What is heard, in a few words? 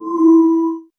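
dark negative feedback tone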